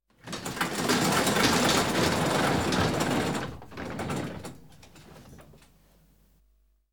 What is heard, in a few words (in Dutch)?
Garagedeur Open; KMP Film Video Sound Effects